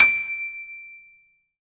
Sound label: PIA MICRO, MICRO-PIANO